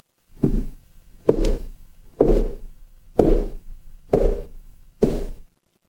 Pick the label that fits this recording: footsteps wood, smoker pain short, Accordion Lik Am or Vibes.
footsteps wood